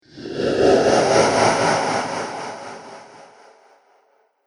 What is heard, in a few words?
Pyramid Phantom, ghost sound effects